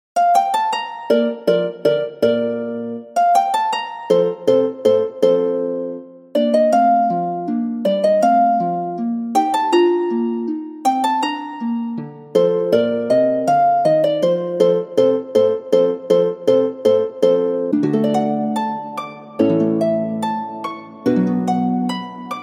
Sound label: Concert Harp; Chordophonet; Syntheway